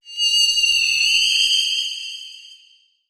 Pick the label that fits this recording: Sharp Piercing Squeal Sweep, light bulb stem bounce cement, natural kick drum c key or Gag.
Sharp Piercing Squeal Sweep